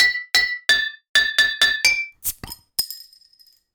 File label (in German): Soundlogo Flasche; Hauptlogo; Gaffel